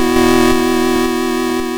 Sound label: YM Chord B; ChipShop Deluxe; ChipShop Hits